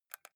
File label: console controller xbox joystick press fast